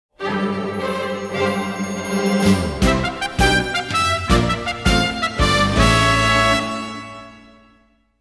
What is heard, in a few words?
Subtitle Music; MUSICBOX